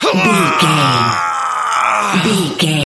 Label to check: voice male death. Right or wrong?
Right